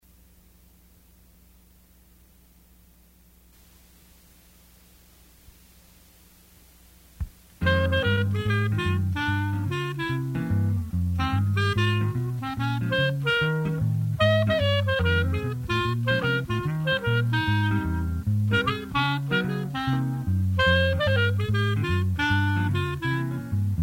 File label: trio sax